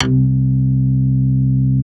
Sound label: BASS STER.